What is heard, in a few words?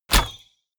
bow shot